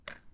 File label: Crunchy Bite